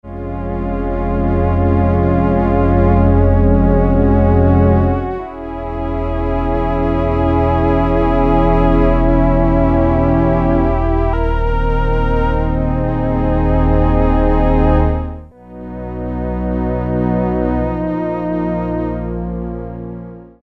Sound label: Soft Strings 16', Korg Poly-61M